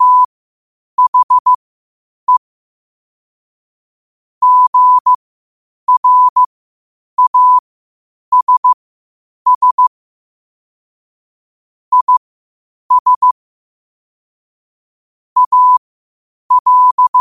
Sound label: 5 wpm, morse